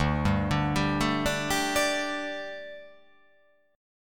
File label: D Suspended 4th D, down